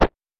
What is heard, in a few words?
Grabbing; Super Smash Bros